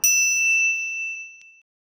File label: a fairy chime sound; magical